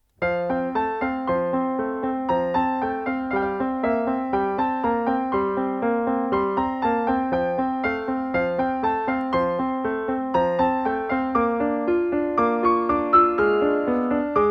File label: piano solo